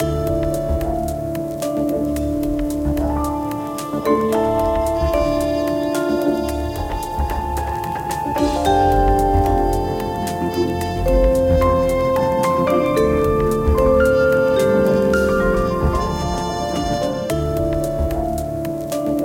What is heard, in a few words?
Presto Digesto! (Lose); Cooking Dash